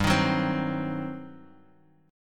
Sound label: G Major 7th Suspended 4th Sharp 5th; strummed